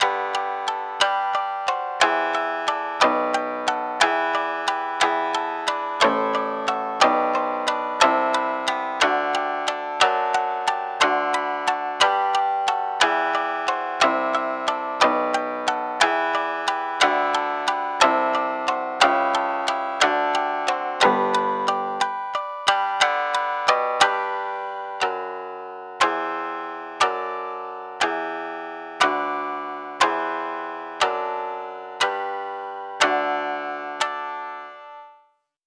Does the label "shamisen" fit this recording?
Yes